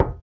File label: knock